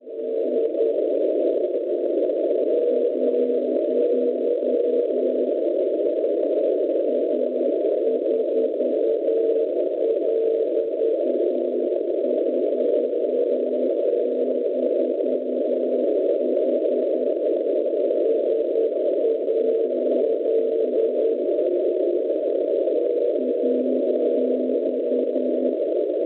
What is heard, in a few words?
NDB, 418 kHz; MK; Calais; Francia; Schwarzsee